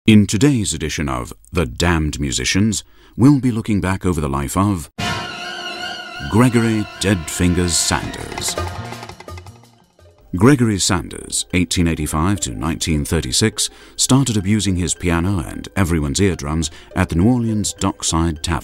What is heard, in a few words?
Piano